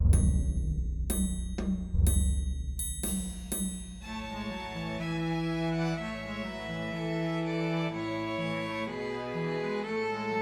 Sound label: Christmas Trios for Two Violins and Cello; Joy to the World